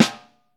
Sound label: Vintage Snare Drum; 3.5X14; Q Up Arts; Johnny.C's Vintage Snare Drum